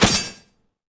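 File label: impact sword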